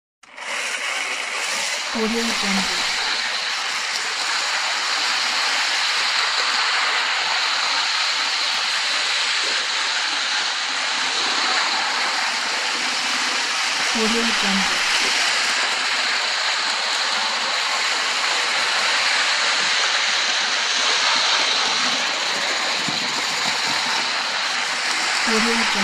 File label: child toys